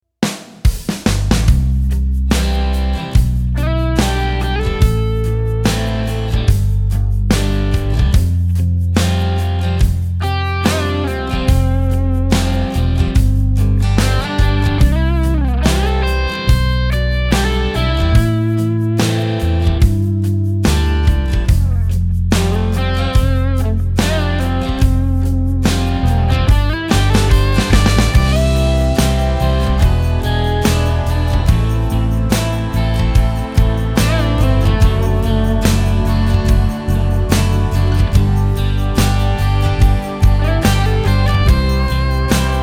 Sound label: ballads instrumental